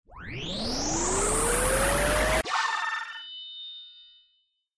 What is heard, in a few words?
daily Double